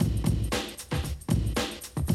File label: Classic Drum Loops